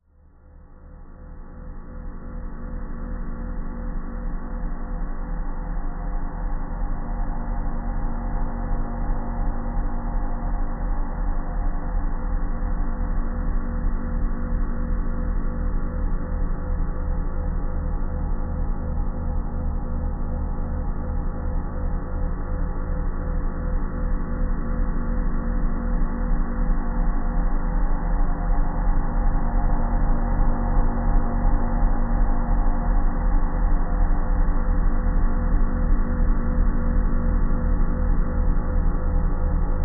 science fiction atmosphere dark metallic ominous slowly building; zapsplat